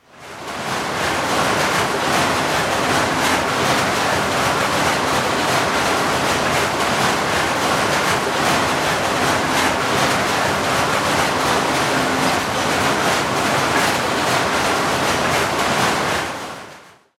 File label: atmosphere with blanket manufacture machines operating